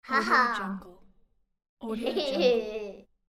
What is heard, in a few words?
girl mocking